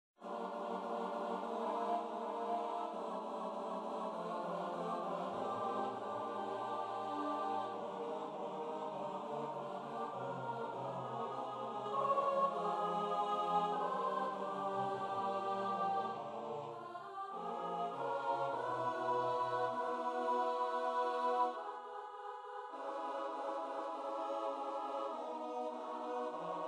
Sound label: choir